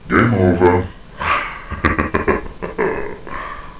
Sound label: game over